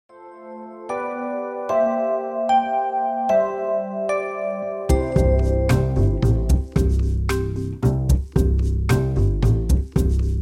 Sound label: christmas jazz classics; Weihnachtspraesente